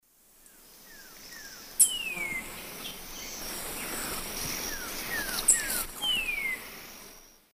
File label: Thlypopsis sordida, calls; S. America; Eastern Lowlands